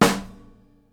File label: SNARE, Live Snares